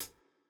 hall resonance hihat

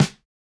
snare, snare house

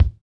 Hiphop kick; Reverb+EQ+Comp+Limit

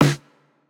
Jrock snare

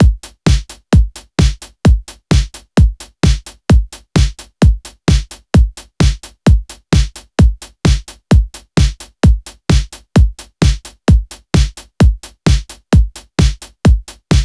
Electro loop; Reference Beat

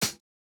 hihat, house

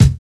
Hiphop kick

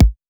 Electro kick; Reference Beat

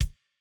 kick; Reference Beat BPM120; j city pop 1980

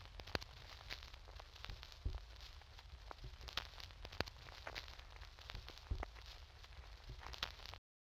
90s hiphop record noise; Reference beat bpm90

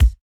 kick, house

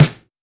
Rock snare; Reference Beat

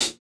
hihat, house

